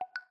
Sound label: Good news, notifications; Tecno Phantom Pad II (G9)